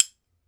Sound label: B. BONES, Ireland, Old World Instruments; E-MU Producer Series Vol. 7 – Old World Instruments